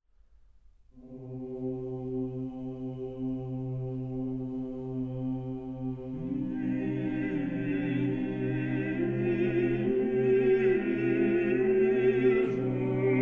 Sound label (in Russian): Хор Валаамского монастыря